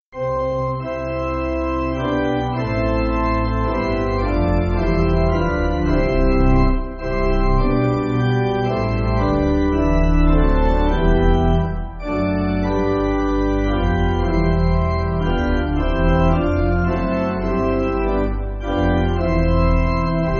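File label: Pipe Organ, Backing Files for Churches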